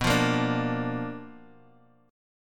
B-Minor Major 7th Flat 5th B down Guitar Half Step Down; BmM7b5